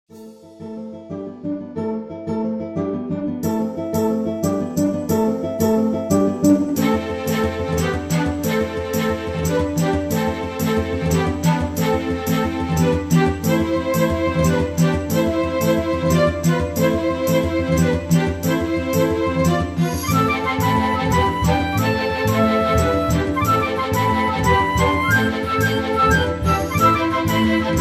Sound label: Persian Dance